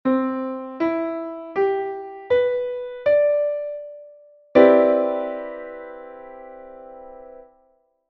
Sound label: CΔ9